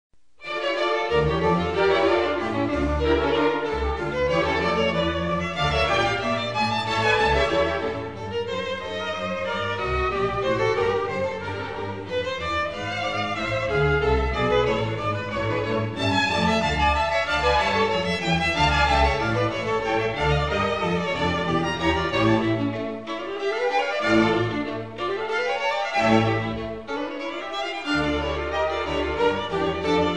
two violins; bach

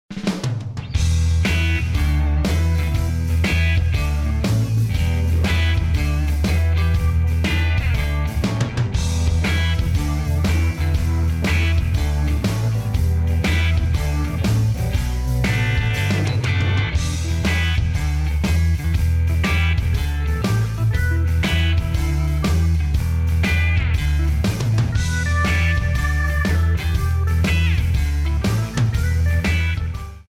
Blues

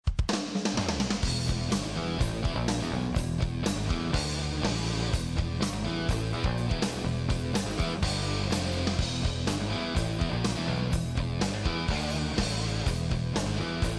Specialty Hard Rock

